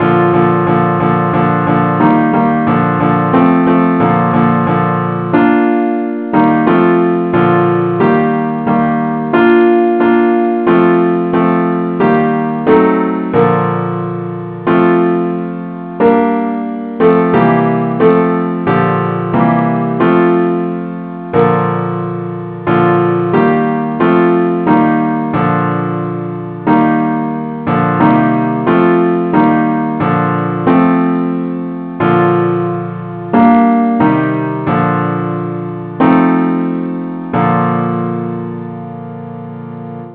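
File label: simple accompaniment, key b flat; christe Sanctorum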